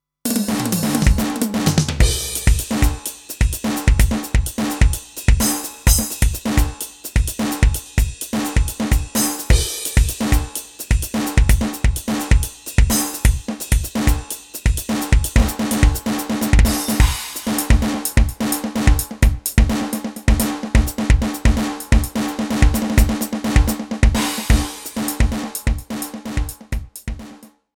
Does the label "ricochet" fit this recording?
No